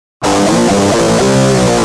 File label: G Jam, Guitar; Industrial